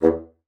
bassoon, E2; Xsample VOL-6

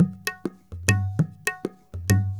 India, Heart of Asia

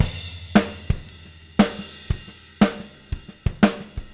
Living Drums; Sampleheads; Peter Erskine

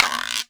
GUIRO L, Korg EMX-1, drum machines

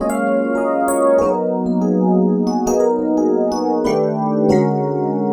EP, Keyboards, Electric Pianos

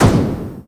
metal, Akai MPC2500

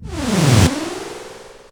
FX; AMG Megabass REMIX! VOL-2

